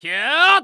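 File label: Mon Man ATTACK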